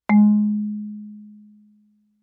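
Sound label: Marimba yarn fortissimo Ab4; MIS Pitches - 2014; Percussion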